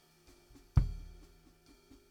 BD Out

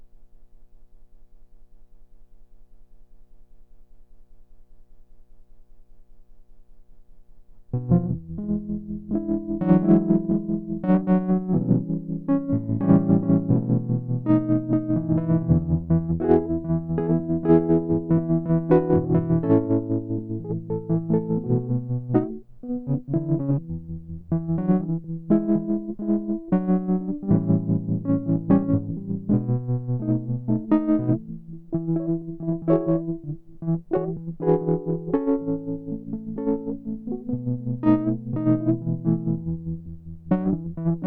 Keys

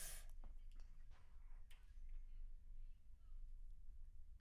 Children Choir; Studio Bekol Children Choir

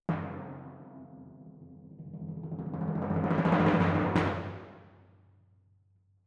Twin F Timp

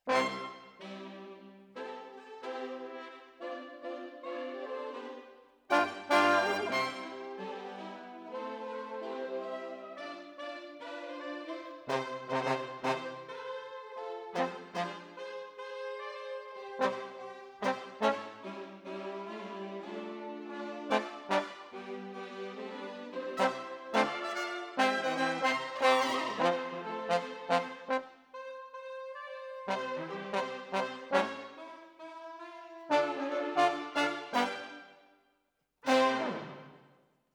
Trombones, jazz for piano

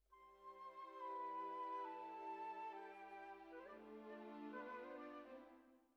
Bassoon, Jazz for piano

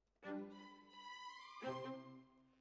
Violas, jazz for piano

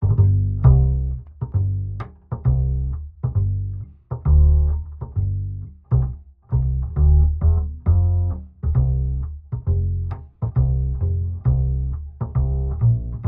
Bass, Jazz for piano

